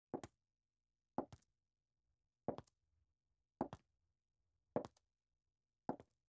Heels; Clothes

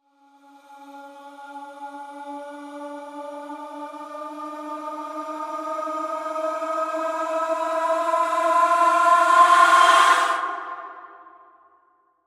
Riser

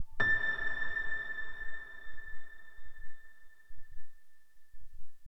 Piano Reverb High Ultra

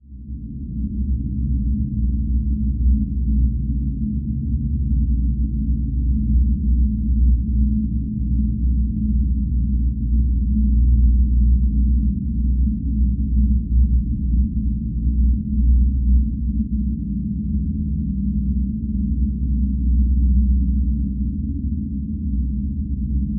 Drone

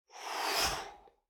Human Swoosh Long; Human Whooshes

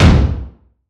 Short Impact

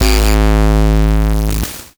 Hit Distortion; Horror

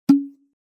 Pop Effect

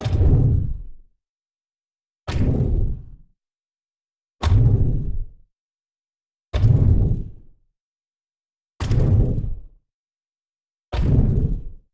Impact Thud Claps

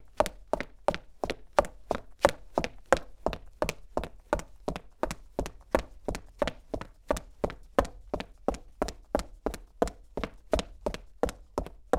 Cement Heels Boots And Running, Footsteps

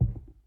Footstep

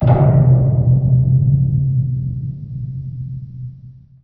Deep Doom; Horror

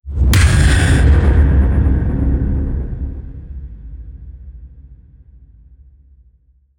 Tension Builder Impact

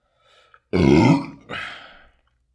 Burp

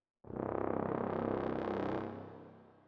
Dramatic Brass

